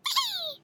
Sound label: battle cry